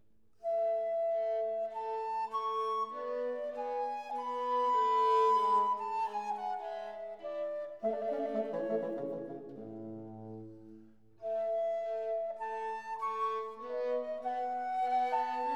Concerto in Sol minore, Andante; RV 104 'La Notte'; Antonio Vivaldi; Concerti e Cantate da Camera (2002)

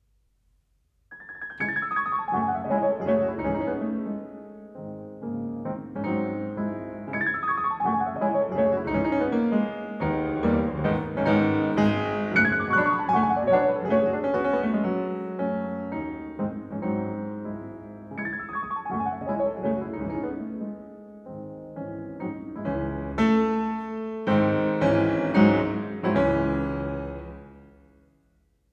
Preludio in do diesis minore, op. 28, Piano; Chopin - Piano Works - Vladimir Ashkenazy

